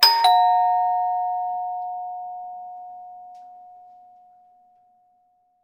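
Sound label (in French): sonnette porte; deambuler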